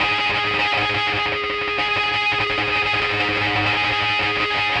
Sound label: FX Guitar